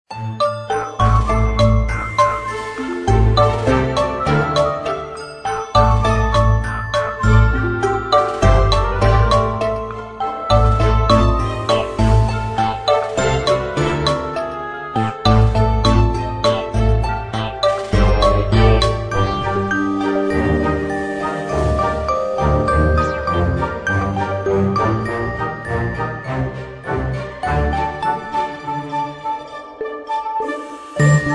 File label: Level Music; MysteryDuck